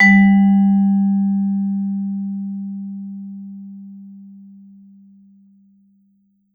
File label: VIBE MED FF G2; Marimba & Vibes; Sampleheads; Dave Samuels